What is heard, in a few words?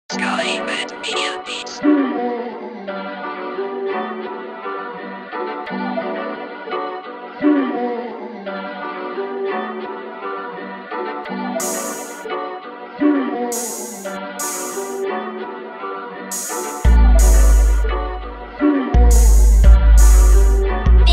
instrumental